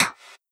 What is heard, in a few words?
Snares, Elektron MachineDrum SPS1 MKII